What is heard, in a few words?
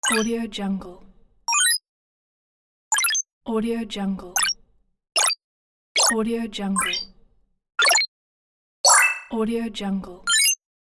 technology chirps and blings pack